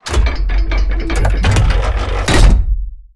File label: cannon filling